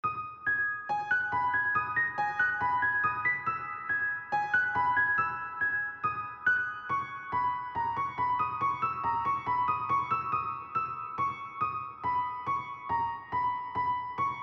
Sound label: loop